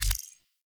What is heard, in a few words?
UI Default Theme Drag End; Warframe